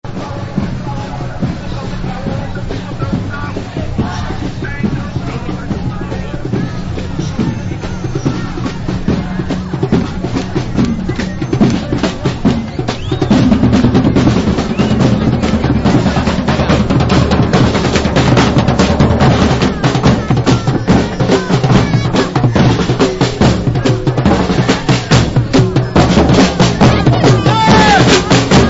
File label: drumming